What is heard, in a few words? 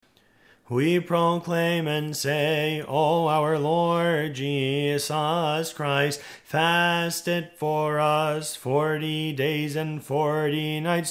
canon; weekends concluding